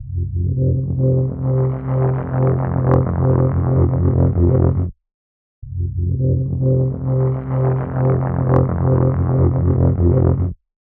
Pad FX; Shinechilla; Alligator

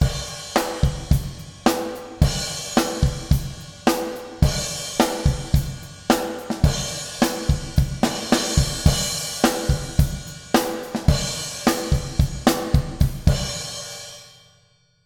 Reverb - Drums - Drum Room; CRTIV Reverb

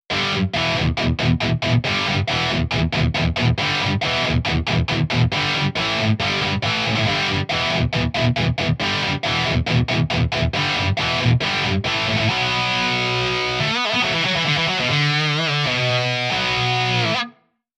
Rock Guitar - Surround Delay; Stereo Touch

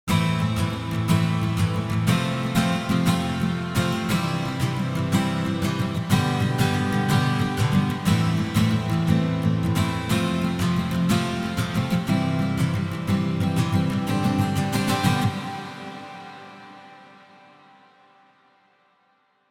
Reverb - Guitar Strumming - Long and Bright; CRTIV Reverb

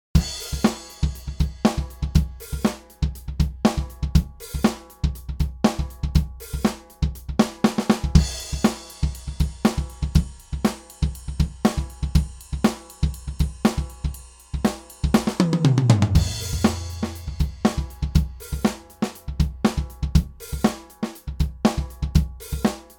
Drums Hickory Sticks Dry

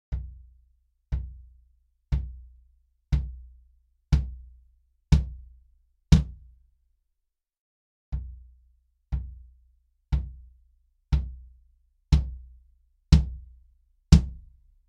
Bass Drum 22 - Hard Beater

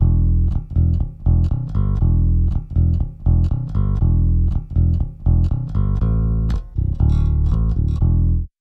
Bass - Fuller Bass Guitar; LF Max Punch

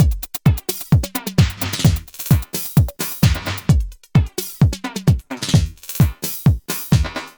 Beat - Bright Boost; Crunchessor

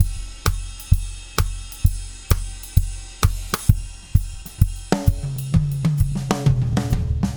Acoustic Drums; Transient shaper audio effect plugin; Crunchessor

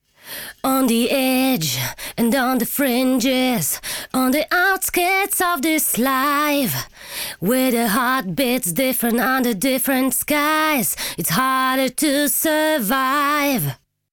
vocals In Your Face Vocals

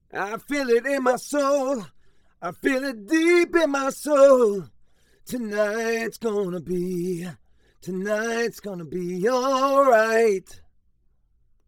Chorus - Vocals; CRTIV Chorus

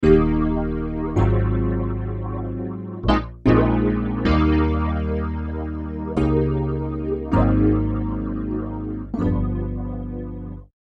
Chorus - Electric Piano; CRTIV Chorus

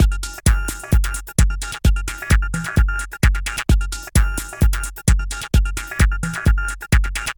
Punch - Beat - Roaring Bass

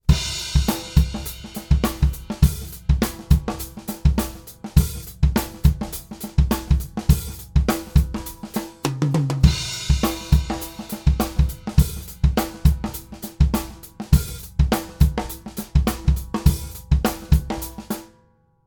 Funk Snare

